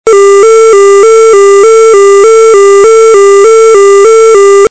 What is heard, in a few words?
tone